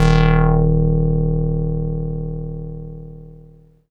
Juicy d#2, Korg Poly-800

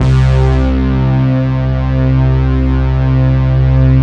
BRASS; Greytsounds; Scott Peers Sound Engineering

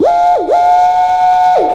FX; AMG Megabass REMIX! VOL-2

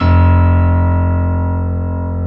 F1 PIANO

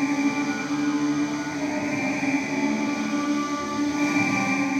Lap Fuzz FX 100 D, guitar drone

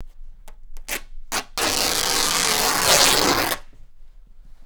rip, Canvas Bag Rips; E-MU Producer Series Vol. 3 – Hollywood Sound Effects

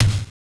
Tom Low; Mortal Kombat 1; M8; Genesis

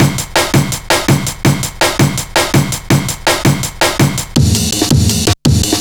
165, Drum Loops; 90 s Sample CDs; Total Drum&Bass

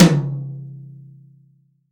H-TOM 10, COATED TOM

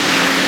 Ambient loop; Majin Tensei 2

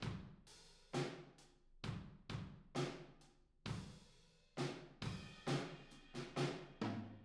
Verse, Room, Drums; Sonic Foundry (Sony Creative Software); Drums from the Big Room The Mixes; Demo Projects

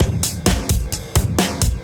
Beat Style, 130bpm, breaks

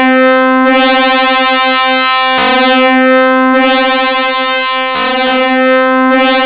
random sweep, VCO ENV FM; Zero-G Ambient 1

